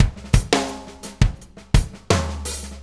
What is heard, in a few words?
Drum Tools, Loops